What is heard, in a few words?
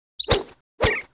BRUTAL ATTACK